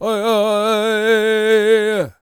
World Voices, CHOCTAW

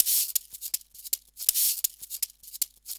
Cabasa 160bpm, African Drums, Extra Percussion; Quazibeat; African Secret

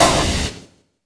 SD, Drum & Bass, 150 BPM; Ueberschall; Drum & Bass Resonance

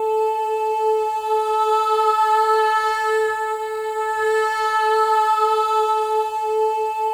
MOVING V4, Symphony of Voices; Spectrasonics

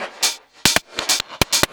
percussion loops, Techno; PS-45

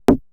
grind, EMU XL-7

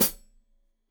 Hat Foot, Hi-Hat, Cymbals Stereo

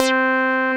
PROPHET REZ, OLD SYNTHS; Rubber Chicken Software Sample Bank; SCI PROPHET

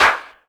CLAPS HIP; Best Service Dance Mega Drums

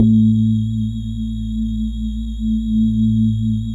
GLASS KOTO; Synclavier Sampler Library; KEYS AND GUITARS

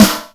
Snare, Roland JX-305

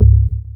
org jazz, Stabs; House; Oneshots

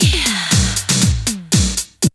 SEX; Zero G; Man Machine

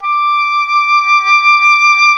WND OBOE2, Oboe vib

